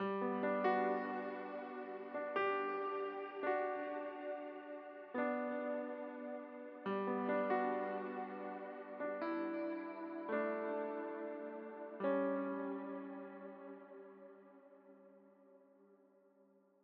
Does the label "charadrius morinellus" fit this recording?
No